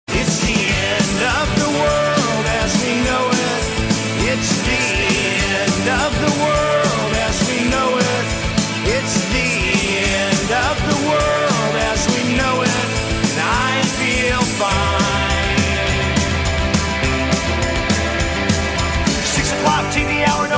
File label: karaoke; chicken little